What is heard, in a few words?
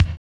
KIK, Cheez Kiks; Club-50 - Foundations Roland